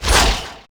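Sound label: watcher tentacle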